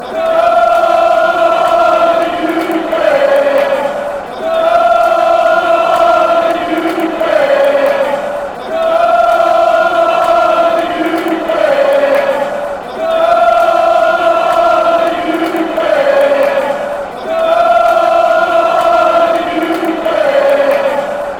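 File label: come you greens, football chants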